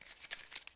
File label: paper use; Escape from Tarkov